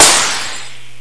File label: Poplosion; Prometheus 1.0